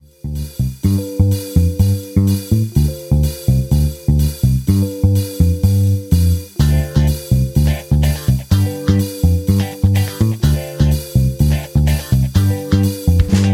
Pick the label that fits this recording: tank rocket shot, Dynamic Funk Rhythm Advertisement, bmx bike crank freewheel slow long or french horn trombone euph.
Dynamic Funk Rhythm Advertisement